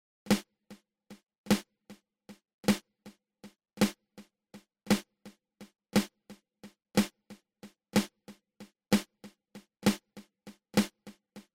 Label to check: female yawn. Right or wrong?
Wrong